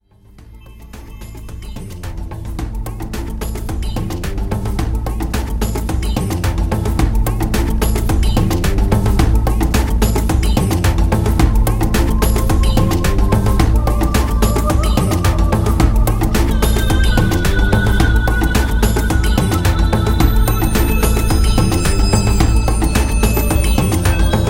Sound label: earth trance